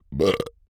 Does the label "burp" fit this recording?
Yes